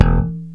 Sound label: bass 3 (E); Sonic Foundry (Sony Creative Software); Ugly Remnants VOL-2; Basstuff